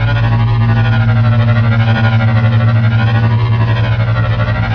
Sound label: maw slow loop